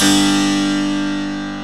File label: HARPSICHORD